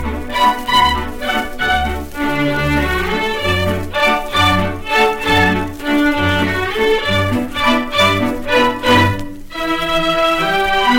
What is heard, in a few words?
the blue danube ringtone; the blue danube classic ringtone for iphones